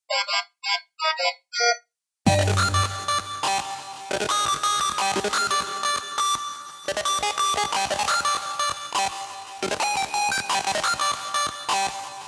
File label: Stepper Jingle